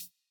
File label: hihat; Reference Beat BPM120; j city pop 1980